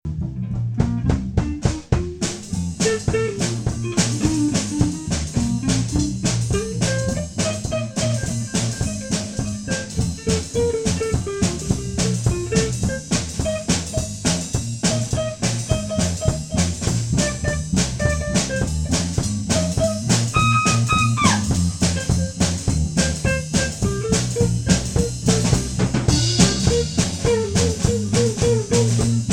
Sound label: box jazz